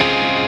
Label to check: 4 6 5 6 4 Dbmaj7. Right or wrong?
Right